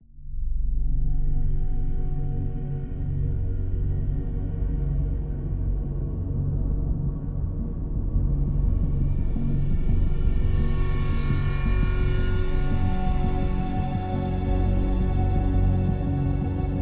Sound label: Subtle Ingame Soundscape Dark